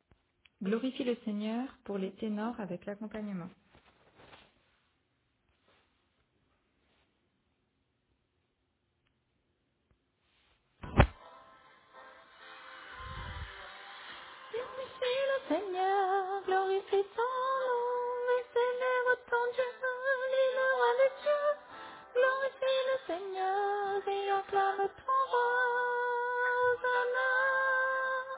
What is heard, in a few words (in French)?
Glorifie le Seigneur Tenor